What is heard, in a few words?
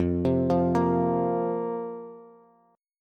F Suspended 4th F, Bass; Fsus4 arpeggiated; JGuitar